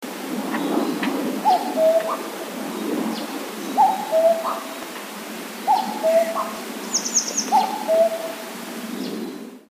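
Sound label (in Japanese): kakko, cuckoo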